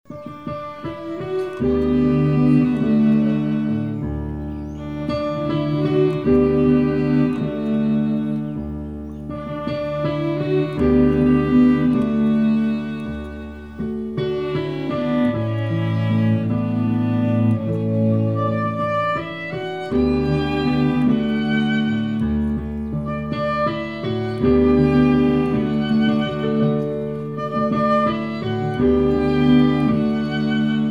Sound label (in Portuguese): Piano e Cordas